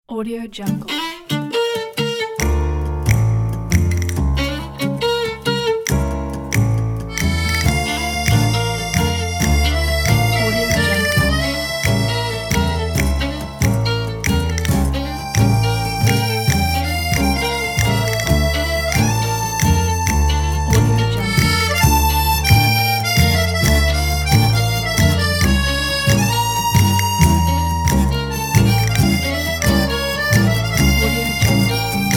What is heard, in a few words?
tango violin guitar accordion